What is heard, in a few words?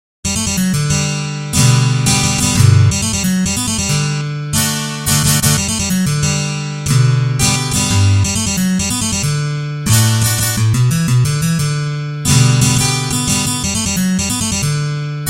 Acoustic Twelve String Guitar; GuitarTempus; Syntheway